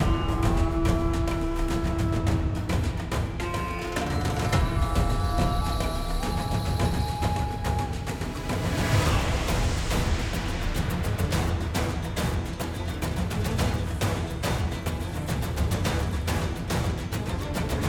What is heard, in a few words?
Battle Desert